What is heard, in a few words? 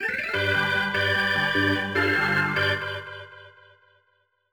Organ; World Series Baseball 2K1; Dreamcast